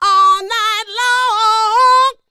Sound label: D BIT, Vocal R&B Dance